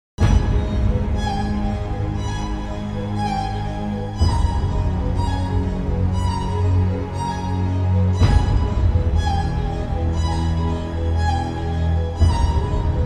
Best Sad Ringtone Mobile

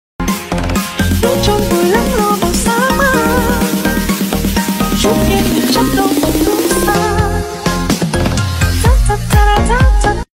Chinese Ringtone

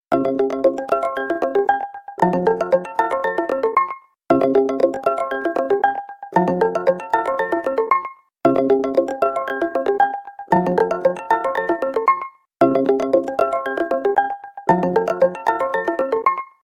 Google Pixel 8 Pro Ringtone

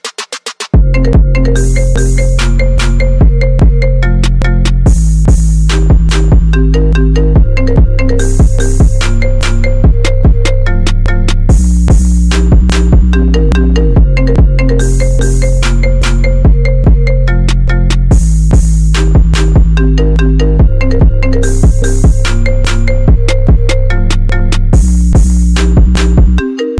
Apple Bass Boosted

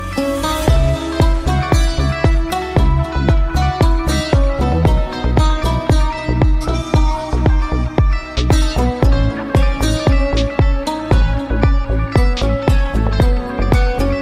Ringtone

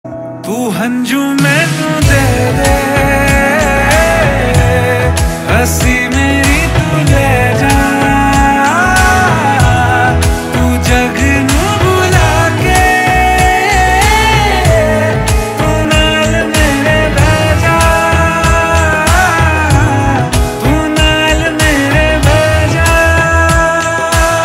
Bollywood movie ringtone

